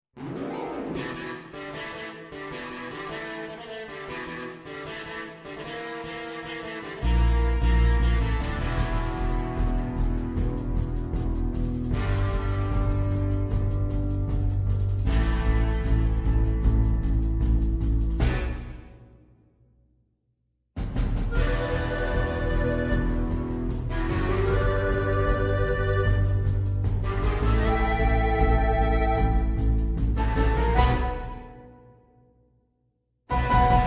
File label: instrumental